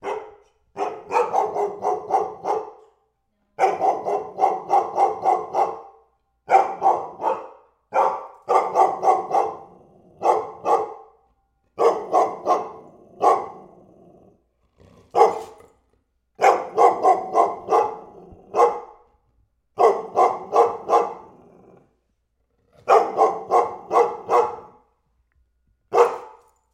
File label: dog bark